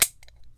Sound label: SNAP, toolbox rummaging